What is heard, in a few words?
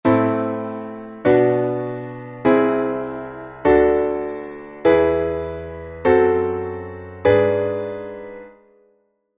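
A melodic minor diatonic chord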